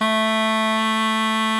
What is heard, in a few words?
KORT A 2, kortholt; SoundBytes - Early Patches